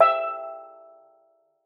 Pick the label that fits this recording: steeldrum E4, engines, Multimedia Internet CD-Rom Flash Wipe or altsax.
steeldrum E4